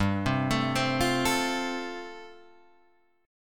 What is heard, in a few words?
G 9th Suspended 4th G down Guitar Open G